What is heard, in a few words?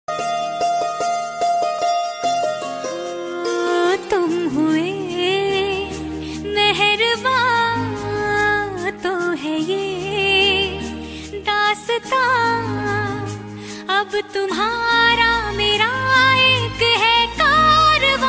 Female Ringtone